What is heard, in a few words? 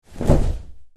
Dracula cloak movement vampire whoosh